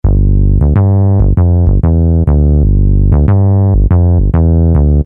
M3 Synth Bass

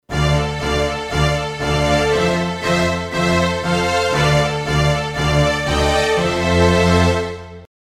M3 Symphony Strings

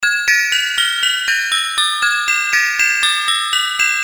D-50 Crazy Clocks

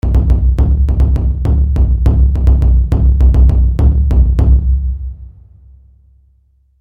TG100 Drum Circle

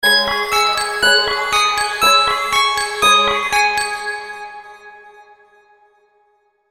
M1 Suspense

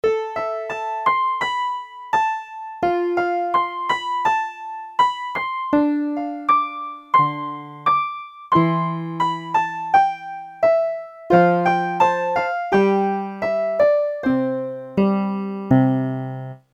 Tentative Piano

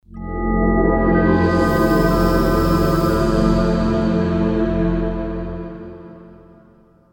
Dull Sting

